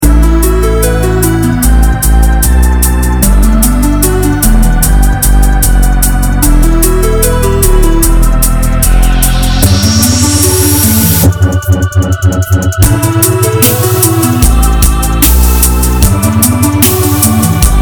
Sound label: strings beat